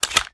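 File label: pistol reload fast mag out; gmod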